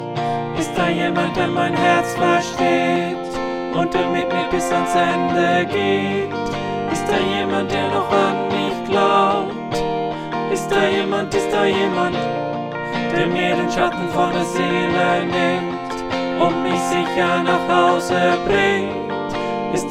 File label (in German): Backvocals Alt